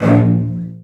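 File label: CELLOS C4, Strings; ILIO; Synclavier Strings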